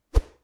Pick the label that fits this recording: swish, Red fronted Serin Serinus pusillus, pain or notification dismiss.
notification dismiss